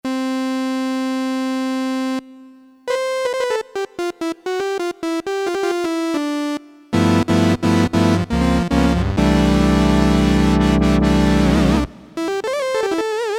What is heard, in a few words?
Drawn, Korg DSS-1, Created Waveforms